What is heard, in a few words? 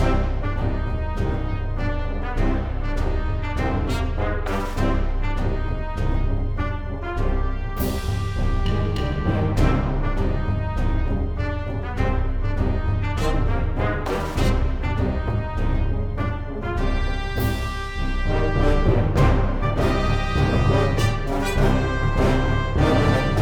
Music Evil March; Dungeon Punks; ps vita ps4 windows xbox one